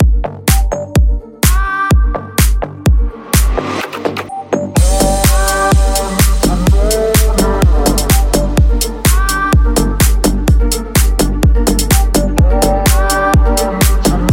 rap house

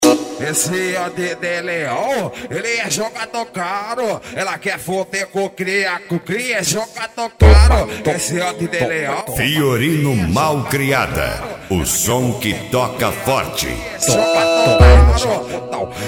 mega funk bass

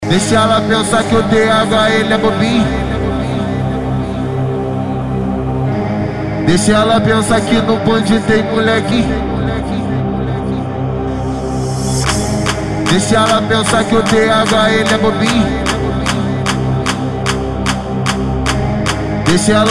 trap funk

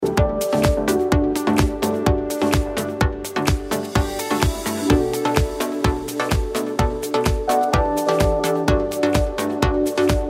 progressive eletronic music

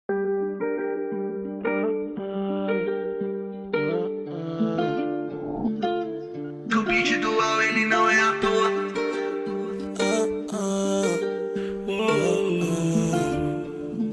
trap

